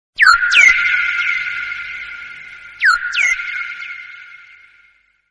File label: birds message